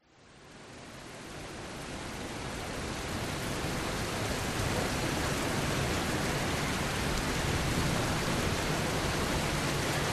nature rain internal home rec open window occ cars pass low thunder rumbles; zapsplat